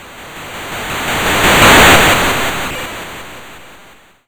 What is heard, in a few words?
Seaside; SFX; YM; CHIPSHOP DELUXE; CHIPSHOP HITS